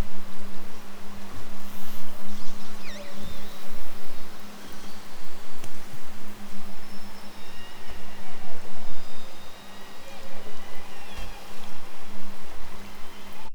click call

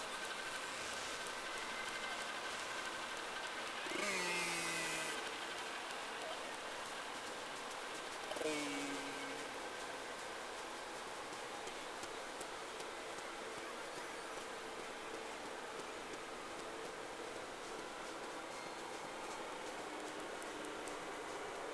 Whales; SeaSnake

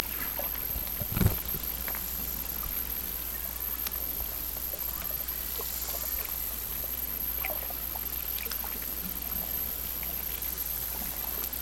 Hydrophones, orcasound

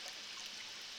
Background Noise Clips

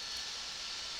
Background Noise

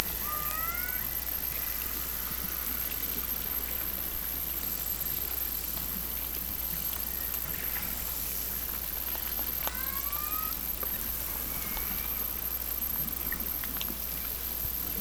Hydrophones, orcasound

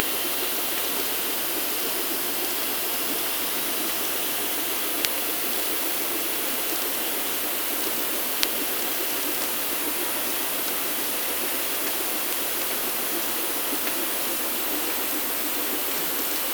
Orca sound Night Passby

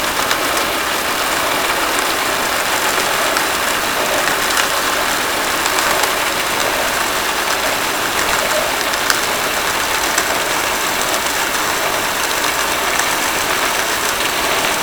Hydrophones, orcasound

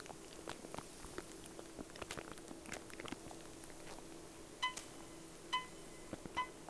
sound, Boat; SeaSnake

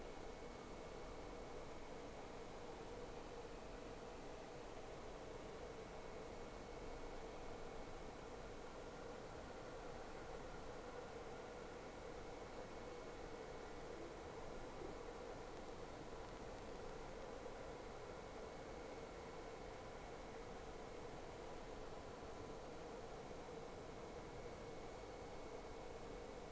Whales; SeaSnake

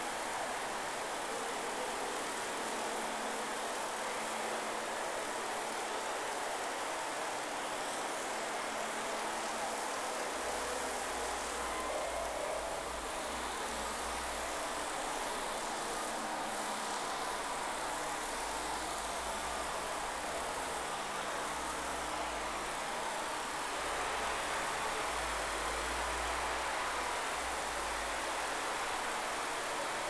Whales; SeaSnake

